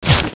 whizz; weapons

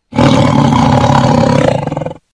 tiger; svencoop; FZSaySound

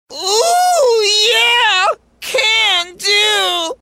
oohyea; svencoop; FZSaySound

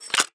load, kar98; svencoop; ins2

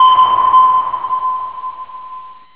sonar; svencoop

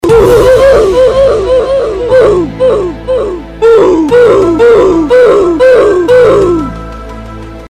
oof; svencoop; FZSaySound

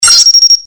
medal get; svencoop

